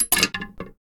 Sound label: hanging on a clothes hanger